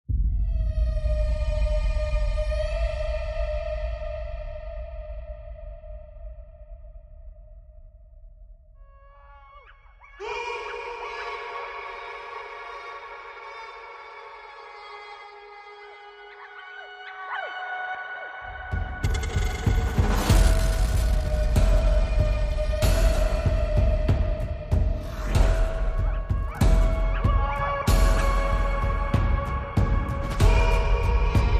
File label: Tribal